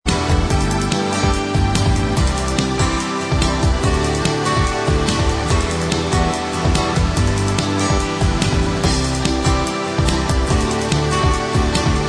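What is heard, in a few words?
instrumental, backing track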